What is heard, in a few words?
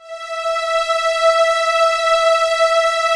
str e4, SYNCLAVIER Strings Bright; Retro Sampler